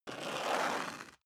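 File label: step high heels concrete grit slide long, footsteps; schritte; menschen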